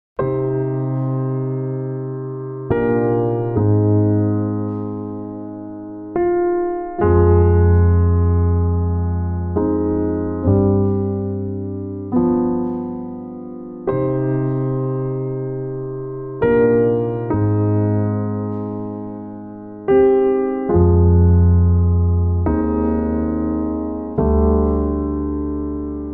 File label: piano, 70 bpm